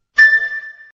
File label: 3d Sms Tone; Honda